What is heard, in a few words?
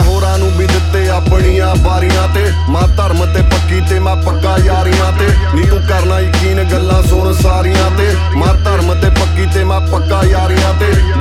Rap; Dolby